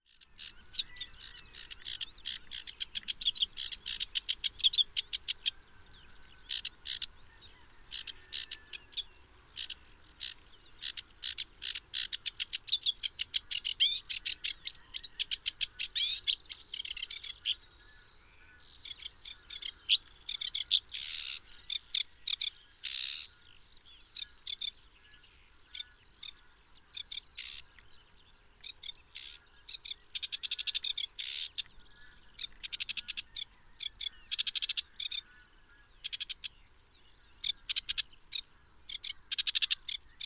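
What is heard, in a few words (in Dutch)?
rietzanger, vogels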